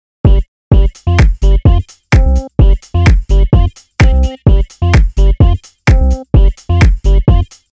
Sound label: Music Only, radio commercial; Youfone; Makkelijk bereikbaar